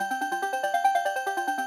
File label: gold roll